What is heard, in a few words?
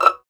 STR 2 PIZZ E6, String Sections, Violins Pizz; Roland LCDP13